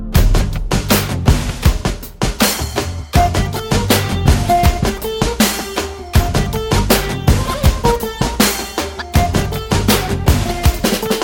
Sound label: Guitar